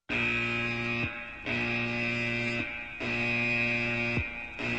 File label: Emergency alarm